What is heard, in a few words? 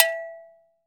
afro ago, afric ago go; AKAI S-Series CD-ROM Sound Library VOL-5